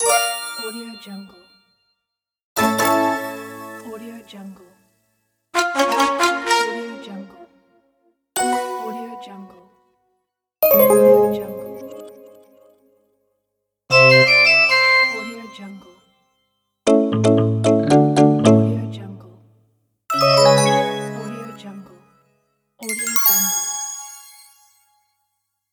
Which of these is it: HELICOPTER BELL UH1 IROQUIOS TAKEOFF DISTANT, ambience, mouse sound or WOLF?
mouse sound